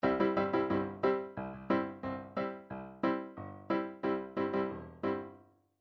chord lines dixie; Midi Files 1000

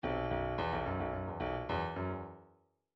bdf midi file grooves funk b funk b

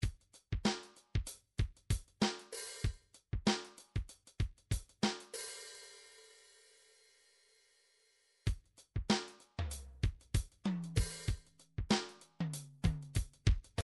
midi drums; Bruford Midi Drums

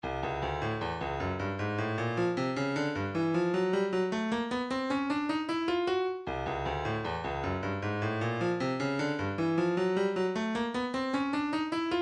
154 Hihat, midi

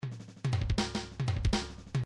fill, midi

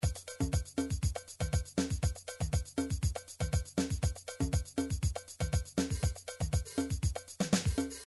midi drums, salsa

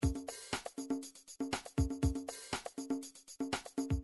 drums new age, midi drum patterns

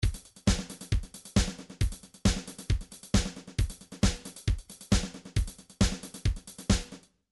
trance midi loops beats ghosted; Newtronic Trance Constuction Kit

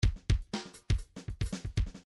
midi grooves funk

